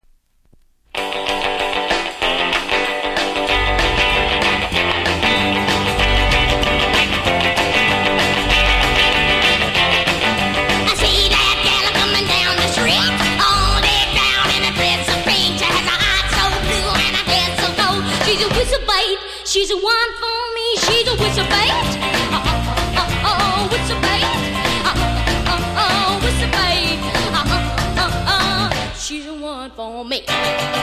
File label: whistle